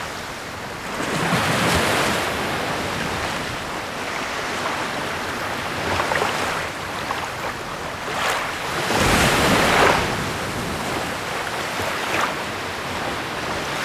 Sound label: Ambience (Beach); donkey kong country returns 3ds wii wii u 2010